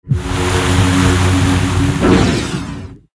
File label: Water casting; Pc Skill; FlyForInsanity